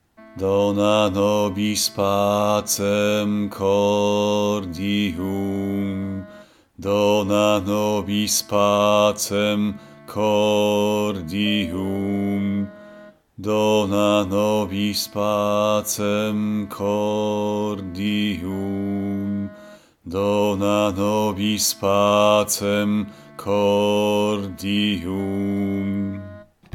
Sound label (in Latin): Dona nobis pacem cordium tenor; Taize